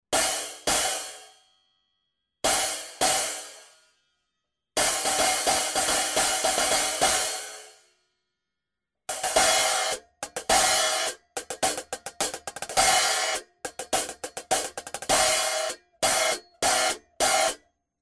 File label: Meinl GX 12 14TH